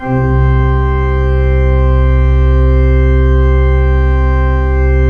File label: PIPE, 3DOrgans